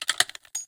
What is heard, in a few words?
357 reload, weapons; Source Engine; video game